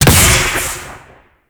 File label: Choir Erupt Proj Explosion